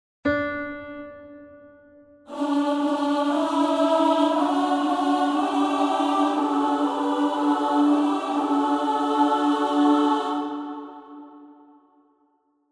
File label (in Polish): Ameen na 3 glosy Glos ALL